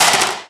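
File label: physics clipboard impact small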